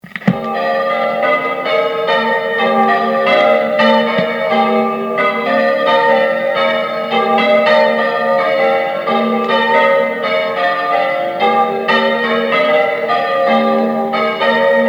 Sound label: 2 Bells